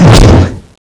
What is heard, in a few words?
attack hole; cstrike; Teddy CSO